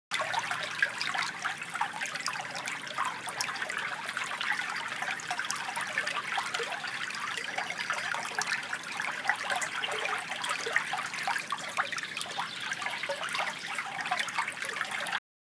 water stream small; best service Blue Box

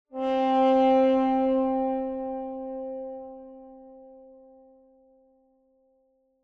Tuba Tone - Single, Lower; Hollywood Edge; sound designer's toolkit v2

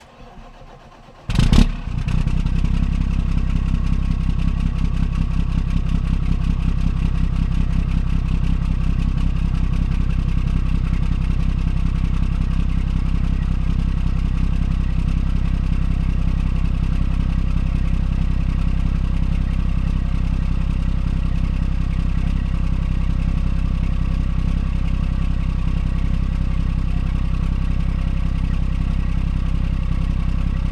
Semi Truck Start; Large Vehicles; Blastwave

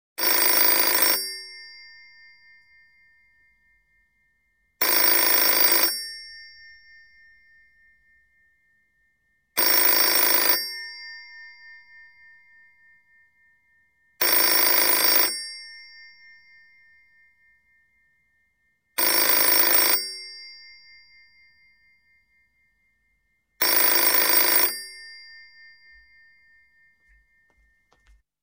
Telephone, European Ringing, Foreign, Office; Sound Ideas; Series 2000

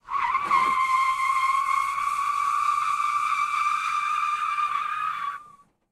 skid asphalt; Blind Drive

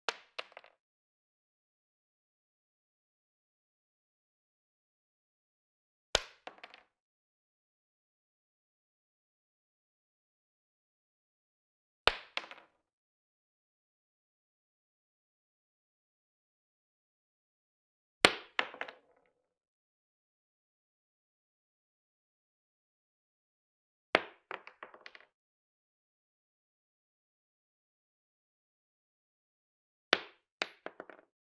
.223 Shell Drop On Wood; Hollywood Edge; Heavily Armed

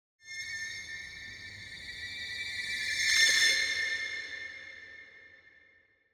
Symphonic Hit Jarring Violins Calls - Rising Call; Hollywood Edge; sound designer's toolkit v2

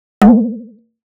Impact Dull Comic Wiggle Pulsating Hit - Thin; Hollywood Edge; sound designer's toolkit v2

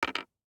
DICE on Hard Wood, Drop, Standard, Two Dice; Samples; ArticulatedSounds; DICE Sfx Party (Extended)

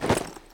Rustle Human Jump Gear; SFX Kit; Foley - Rustle

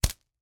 slingshot, dry firing, slap, rubber, rustle, shot

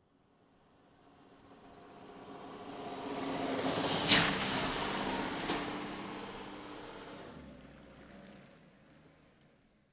Automobiles various

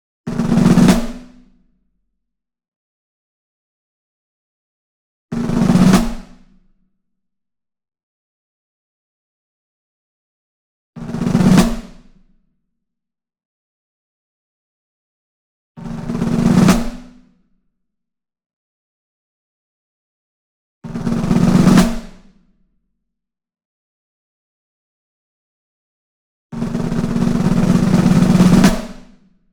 SINGLE SNARE Ludwig Black Beauty Roll Near; boom library; Cinematic Strikes Construction Kit

